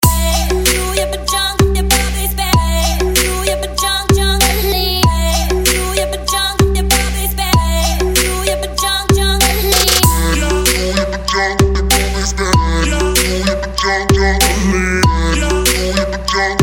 trap

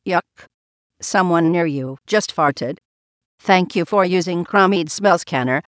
Someone Farted

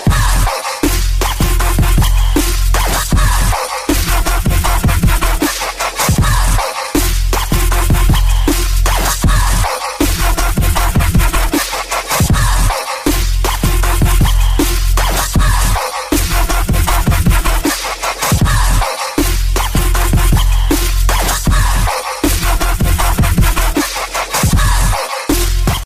Puppy

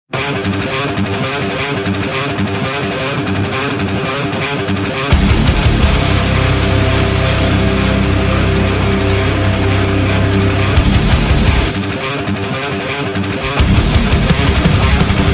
Rock

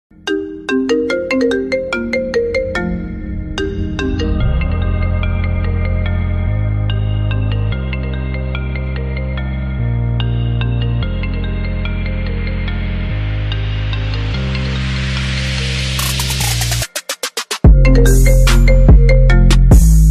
IPhone 8-8plus

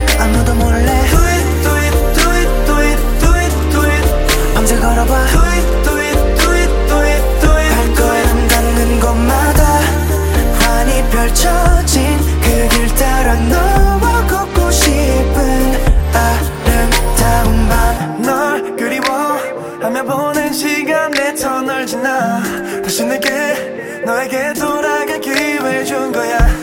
chorus

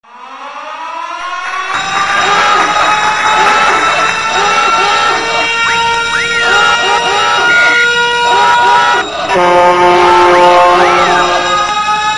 Noisy

